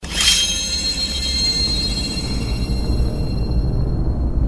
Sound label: Sword Sound